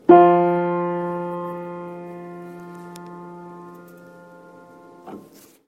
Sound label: Unknown Spinet F3 F4 pure 4 2 just octaves